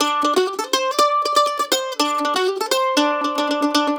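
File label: lick, left, blues guitar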